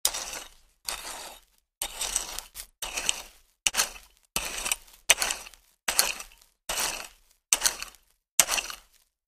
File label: Hoe Dirt, gardening